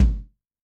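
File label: Maple Ambience, Kick, Yamaha DTXpress-II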